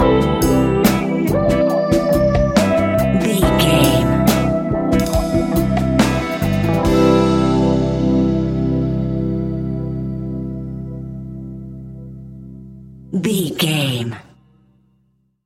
soulful jazz noir lounge hip hop, A classic piece of Hip Hop and Rap music; perfect for budding rappers, ideal as a beat to rap to